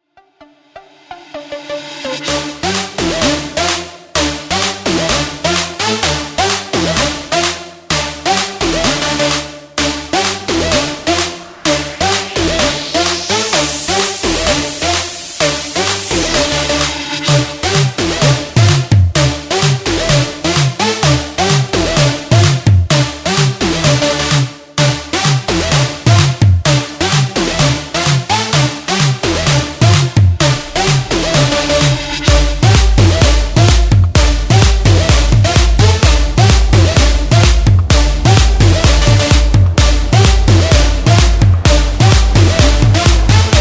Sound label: Original Mix